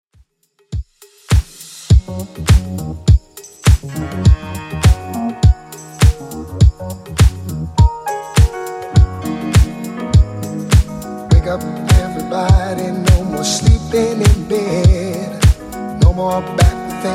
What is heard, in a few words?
70s Redrum 102bpm